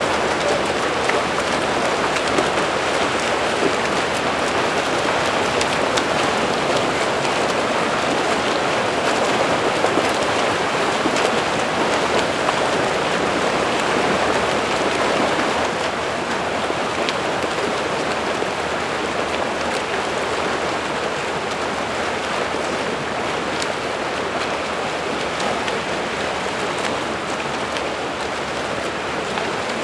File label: Swim Meet